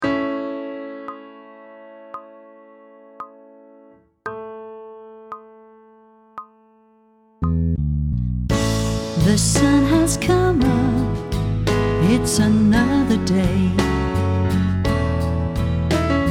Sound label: Sight Singing with vocal, pop vocals; Grade 8